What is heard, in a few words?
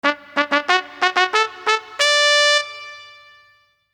victory trumpet